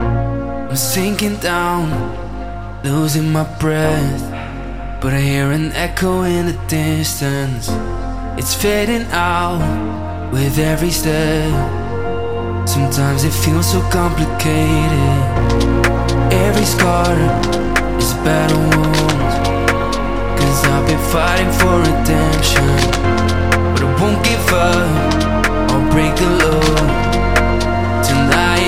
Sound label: Construction Kit Full Mix; sickrate siik essentials iv